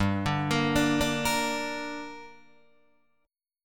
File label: G Minor G, Guitar, DADGAD; chordshape; down